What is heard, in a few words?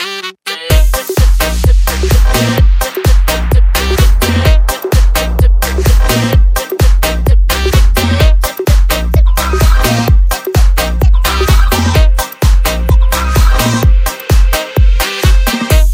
synthetic sax